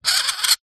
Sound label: science fiction robot motor server movements